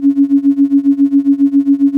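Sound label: tremolo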